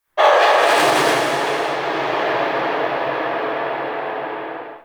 Champion Hub Texture E, Ambience, Dungeons, Under City, Crypt